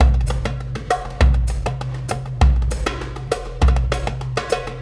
Tactile Impulse, Processed Drumkits

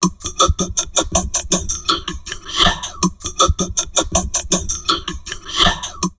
TALK, Drum Loops Crazy Processed

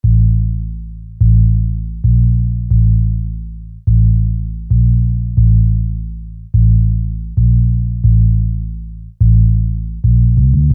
Sine Bass

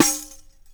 dum, pitch perc; East West - Global Sounds

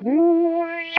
ZEEELP, Funk Guitar; 90 s Sample CDs; Zero G

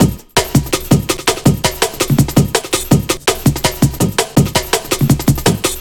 165, Drum Loops, Drum & Bass; Total Drum&Bass

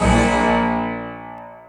alarm; Ueberschall; Techno Trance Essentials

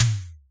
hip hop kit low tom

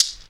MARACAS, perc; Zero-G; Funky Elements

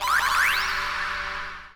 Ragga Fx; Zero-G Sound Sense Grime Scene; 90 s Sample CDs

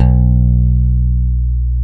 B 1, PICK BASS; AKAI S-Series CD-ROM Sound Library VOL-7

